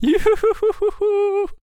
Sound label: Chariot Attack Vocals